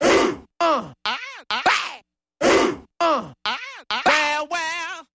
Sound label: R & B Vocal Variation